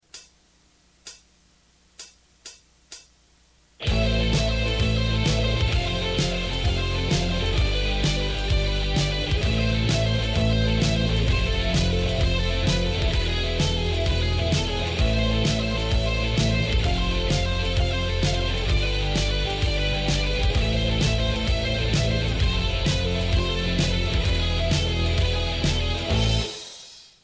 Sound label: arpeggios, guitar